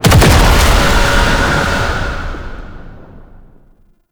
Brimstone Cast Impact; Uriel Brimstone; Warframe

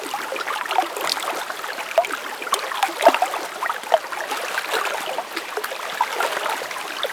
Dojo Koi Pond; Warframe; Dojo Props

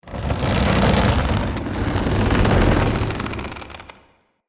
lantern crate push fall; Objects; Ode Lantern Crate

